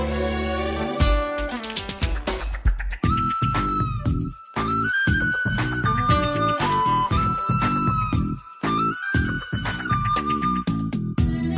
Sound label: Flute Ringtone